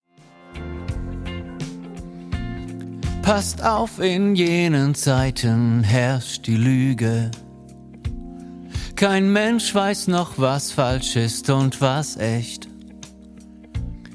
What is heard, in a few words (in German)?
Lern-Bass und Solo